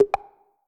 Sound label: cool percs upwards